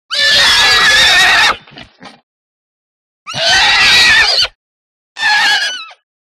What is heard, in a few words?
Close Angry Horse Whi